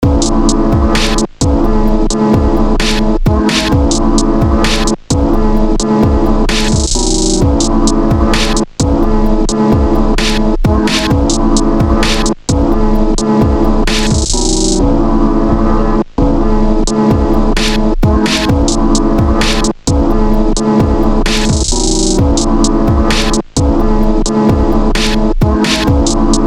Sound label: beat tape